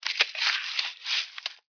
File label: dig; Zeus